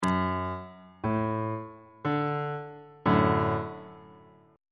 c double sharp major 1st inversion on bass clef

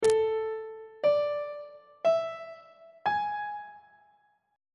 a major 7th suspended 4th chord intervals; on treble clef

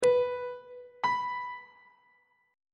b note on treble clef

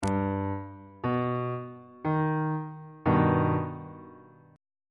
b augmented 2nd inversion on bass clef

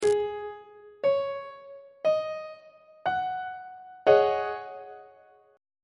a flat dominant 7th suspended 4th chord; on treble clef

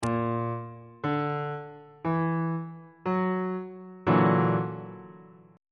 g flat augmented 7th 1st inversion

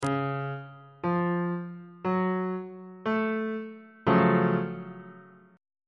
g flat minor major 7th 2nd inversion on bass clef

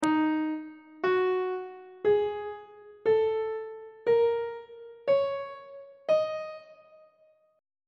e flat blues scale; on treble clef

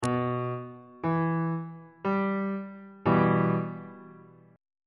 e minor 2nd inversion on bass clef

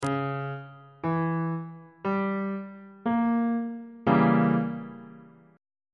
a sharp diminished 7th 1st inversion on bass clef

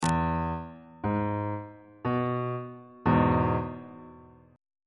e major chord on bass clef

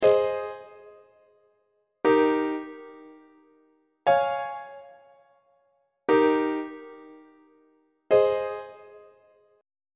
a flat major plagal cadence on treble clef

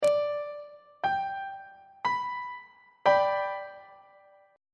f double sharp major 2nd inversion on treble clef

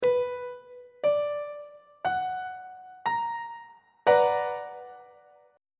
a double sharp minor major 7th chord; on treble clef

